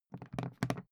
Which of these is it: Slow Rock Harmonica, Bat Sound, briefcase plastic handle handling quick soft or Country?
briefcase plastic handle handling quick soft